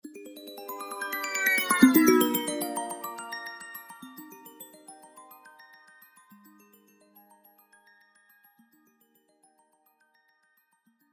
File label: doppler shifted ringtones ios